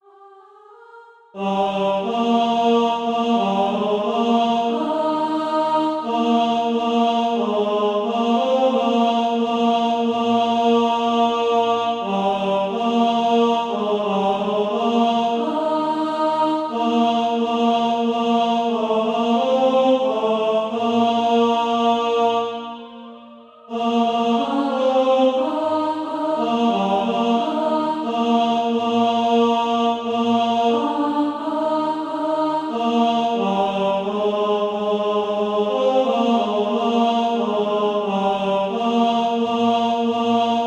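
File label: tenor, Practise Music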